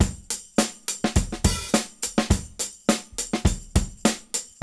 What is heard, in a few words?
LOOP 104; AMG Gota Yashiki Groove Activator; JR.LOOPS 104